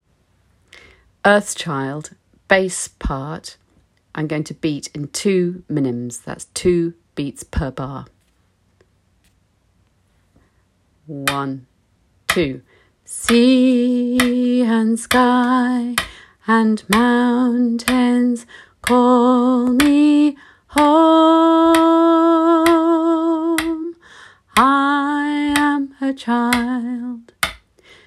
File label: Bass